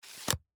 insert card

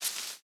pick, farmer

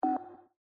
notify warning

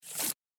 pull out card, atm

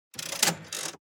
spin, casino

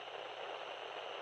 loudspeaker noise

